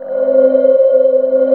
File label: amb c, Bell Atmos, Chillout; Best Service ProSamples vol.36